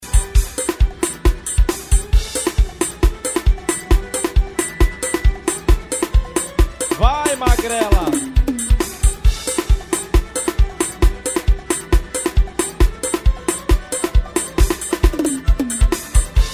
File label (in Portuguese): forro ao vivo